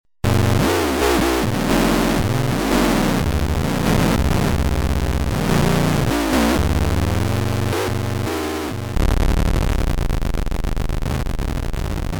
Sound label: alien first words dirge warp